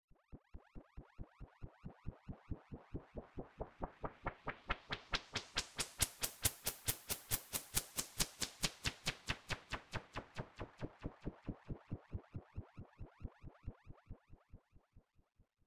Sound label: Pink Noise Effix Delayed; Techno